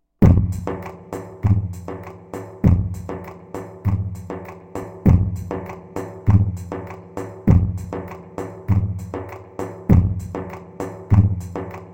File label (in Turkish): pa 500 tr halay, korg; tr karisik ritimler